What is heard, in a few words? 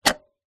industrial leaf blower electric battery remove from plastic charging dock